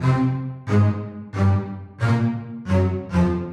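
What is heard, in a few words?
Double Basses 136-C, horrorscape samples 136bpm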